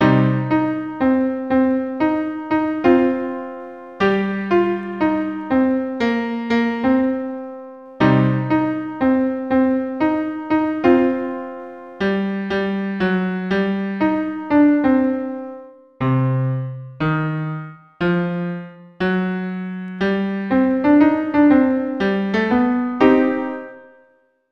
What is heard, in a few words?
Jazz Blast - 120 bpm